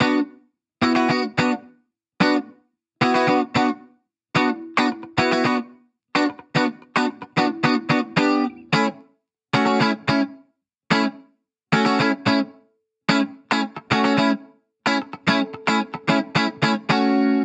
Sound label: Guitar Bpm 110 Key B minor; 70s; Fox Samples - Back to the 70s Guitars